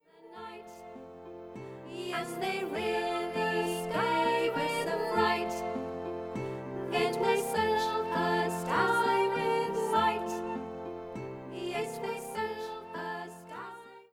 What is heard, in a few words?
Singing in the Night; The Lucky Reporter